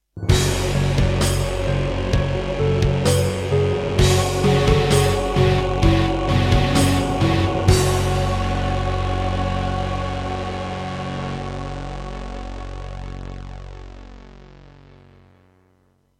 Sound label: Introduction, Serdaco MP32L GeneralUser GS Bank; Doom (Sigil)